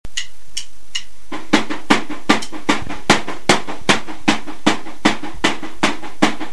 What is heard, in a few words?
drums